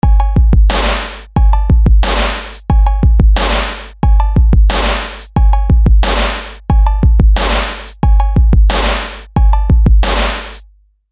4 beat

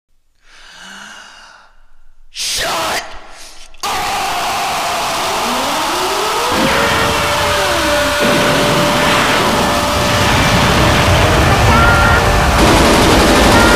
boom

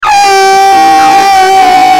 noise

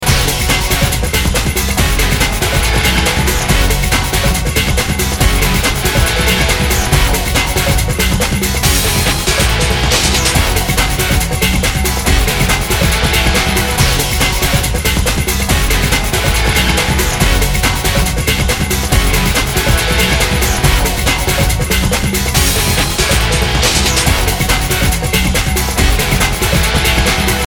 Spy Bleep

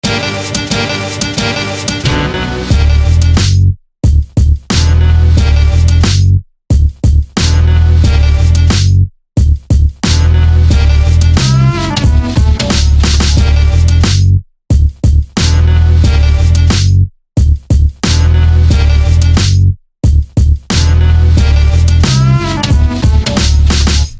darkjazz

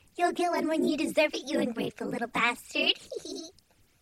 hehehe

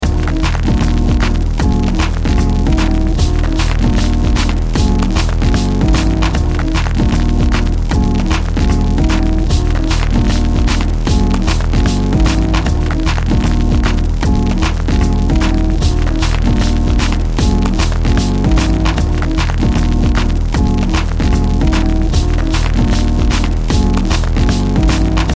Break

